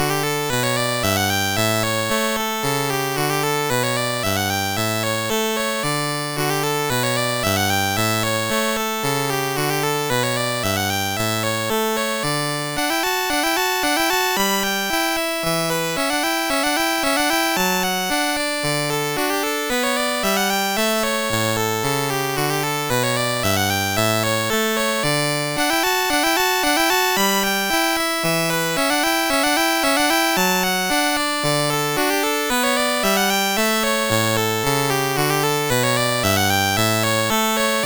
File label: MOS6581; MOS6581R2